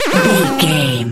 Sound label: retro alarm siren loop